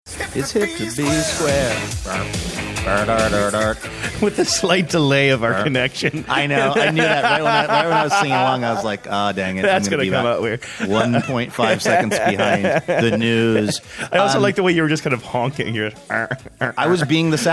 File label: singing honking